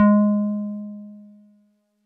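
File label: Wood Piano DX100 G#1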